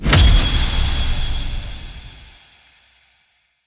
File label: Diorama Shards Complete; Warframe; Glass Quest